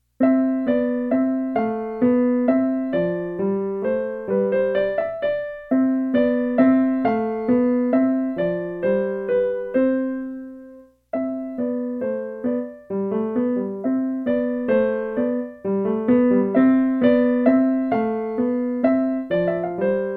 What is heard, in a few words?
Minuetto